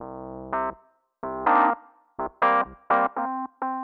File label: 125 Rhodes C; PowerFX - Nu Jazz House